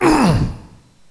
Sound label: pain; Alien Arena; infantry